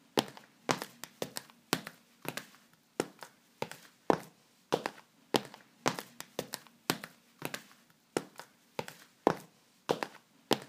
High Heels Walking

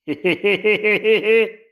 Crazy Man Laugh

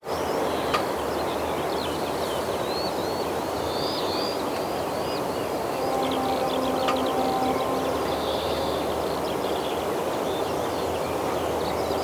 Bird Morning With Train

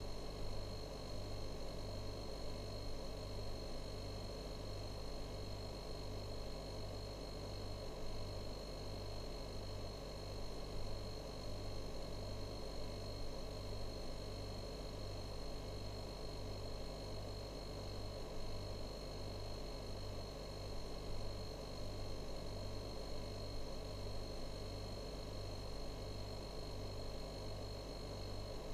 Fridge Hum Buzzing Light

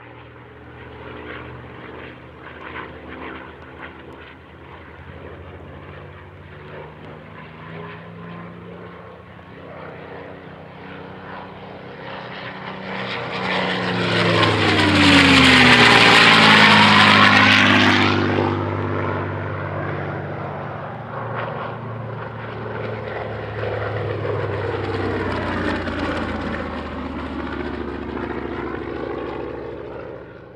A6m Zero Chasing P 51d Mustang

